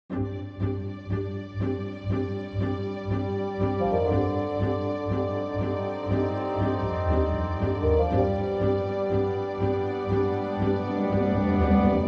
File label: Orchestral Cue